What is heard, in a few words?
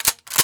Shotgun Rack